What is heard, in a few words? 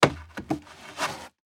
Foley Wood Grab And Slide